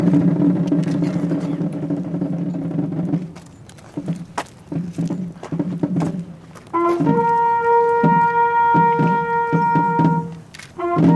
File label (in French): militaire sonnerie aux morts